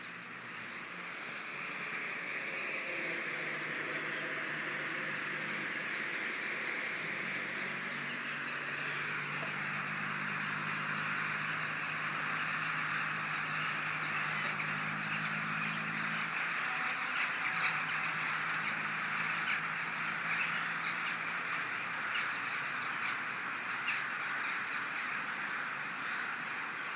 thousands of rooks leaving belleek wood mayo each morning